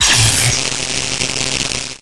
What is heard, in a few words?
Electric shock